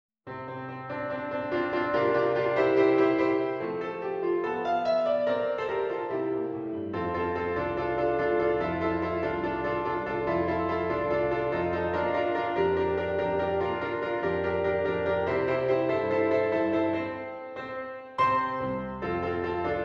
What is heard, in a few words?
accompaniment track